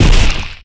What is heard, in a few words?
Melee Magical Heavy; Kilta